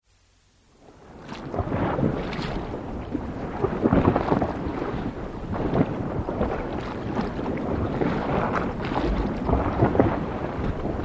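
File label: killer whale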